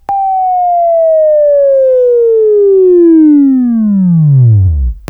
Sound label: WAV Right, Sweeping; Sweeping Training program